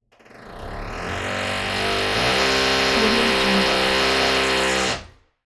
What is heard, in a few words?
construction tool drilling adjacent apartment